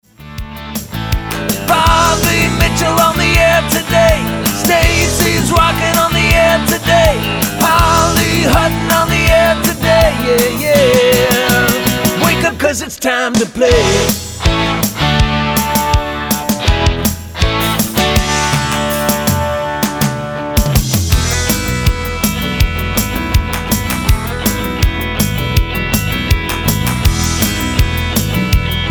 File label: rock in usa jingle; WOMC; comedy demo